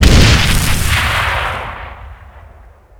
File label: spell meteor impact; Garry's Mod; tf2